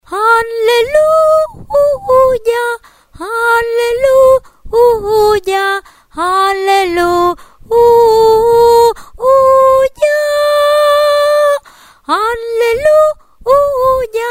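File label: soprano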